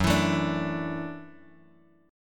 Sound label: F sharp Minor 7th Sharp 5th F sharp Bass Standard; strummed; JGuitar